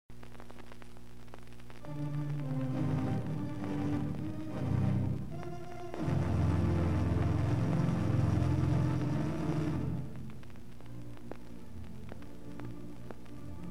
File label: Plays Gigantic Pipe Organ